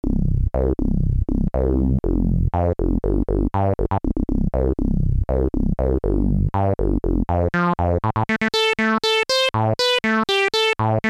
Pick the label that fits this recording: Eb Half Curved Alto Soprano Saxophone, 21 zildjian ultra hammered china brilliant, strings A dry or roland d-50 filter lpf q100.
roland d-50 filter lpf q100